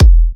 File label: E kicks; markus hakala kicks